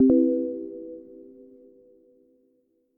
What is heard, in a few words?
double tap